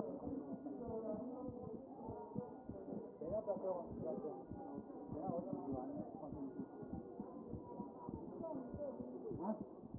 CHEST

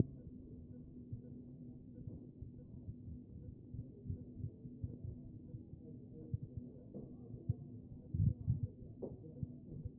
CHEST pos4 B MODE

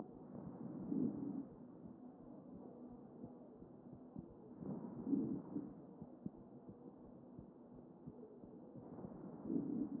A CHEST

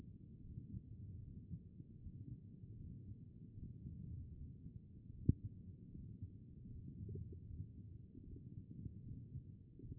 HEART B MODE

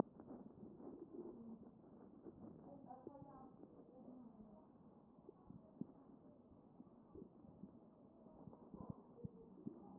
A CHEST

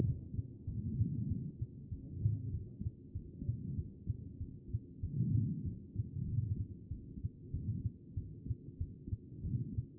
HEART B MODE; cardiac

